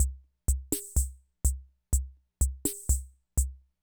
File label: Loop, classic machines; arranger